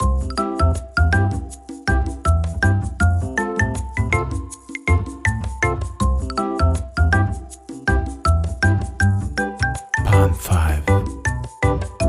Game Landing Page

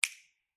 snap

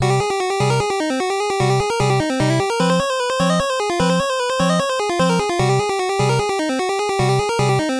dungeon theme; final-fantasy

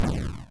asplode

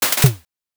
hero skill attack

